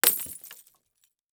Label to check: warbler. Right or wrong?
Wrong